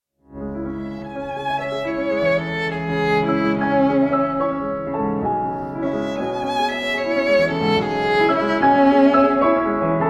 sonata in a major for violin and piano 1st movement allegretto ben moderato; csar franck